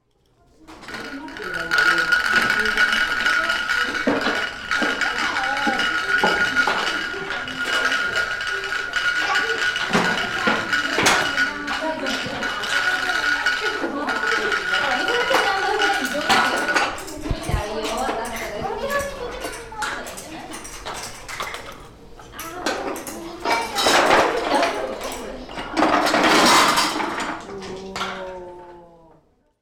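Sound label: hand-pushed cart; Chino Cultural Complex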